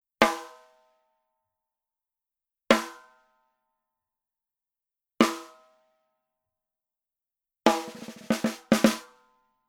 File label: SNARE Power Center Rim Shot